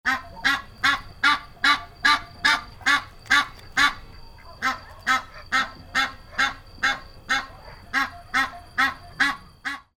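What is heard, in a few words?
sounds goose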